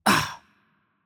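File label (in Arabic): Hurt Light, Arabic; bloom minigame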